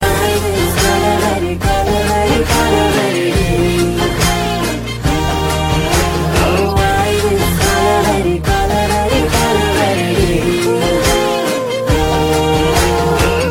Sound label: girl chorus